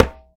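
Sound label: Conga Hi Mute; Roland BK-5; Percussion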